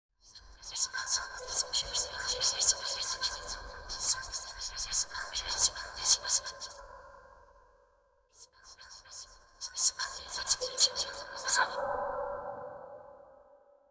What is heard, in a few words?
four airy woman voices wh, mystical